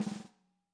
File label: real snare; REAL LIVE KITS